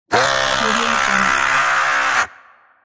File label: game character creature voice demon scream